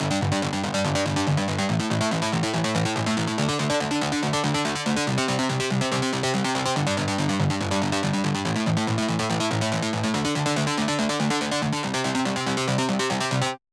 PWM Kit 142BPM Verse - Dist Arp DRY, Gmin; Vengeance - Essential Clubsounds Vol.5; Melody & Synth

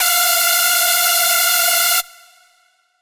lead hiss F; leads

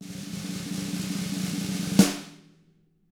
ROLL, snare rolls; ILIO; Double Platinum Drums 1

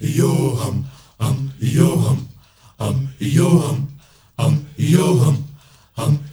MALE YO, HUMMERS; Spectrasonics; Heart of Africa

